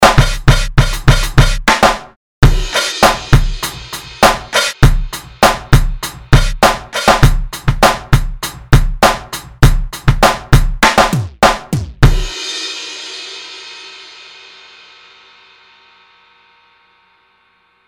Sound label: Hard garage